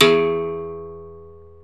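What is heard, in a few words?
open string, berimbau; Berimbau Xswitch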